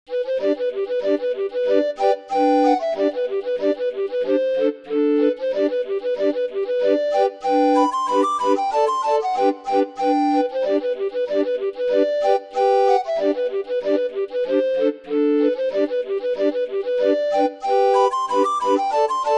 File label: Soldiers joy, orgue barbarie